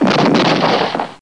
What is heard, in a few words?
explod; duke nukem total meltdown